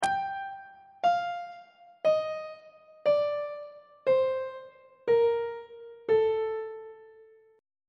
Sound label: a locrian mode descending; on treble clef